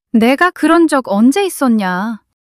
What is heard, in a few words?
surprise